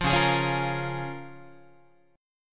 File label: C sharp 6th C sharp Guitar; strummed; C#6; JGuitar